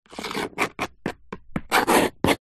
food knife scrape vegetable cuttings plastic chopping board; zapsplat